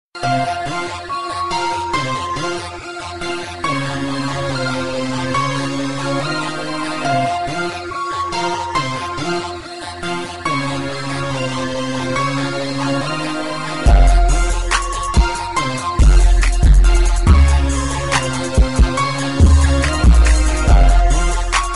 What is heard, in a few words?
hip hop beat